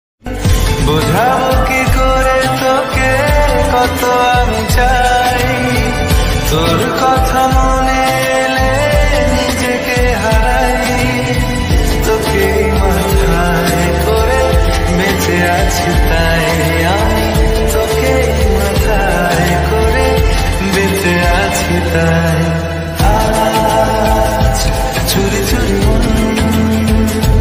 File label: lofi bengali ringtone